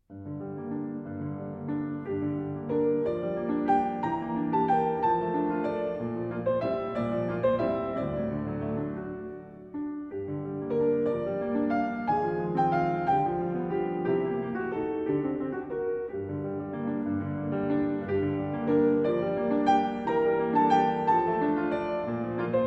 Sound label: Sicilienne, Version For Piano